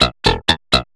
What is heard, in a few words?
HOUSE FX 125